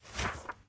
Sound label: lectern flip; smbx2; Redstone Test World